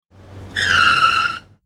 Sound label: sliding, sliding tires; sliding car sound; teststrecke game